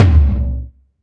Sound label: Tom, Drum Hits; Zero-G Trance Inducer; 90 s Sample CDs